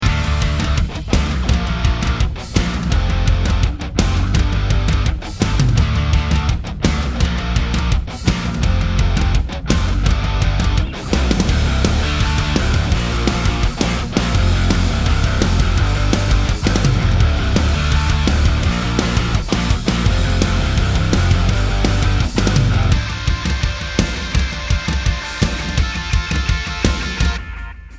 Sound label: choose team